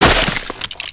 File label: Crates n Boxes, destructibles